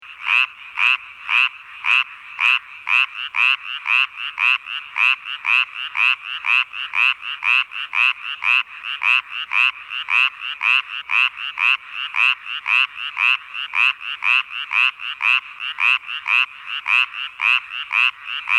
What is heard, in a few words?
Hyla Japonica Is Calling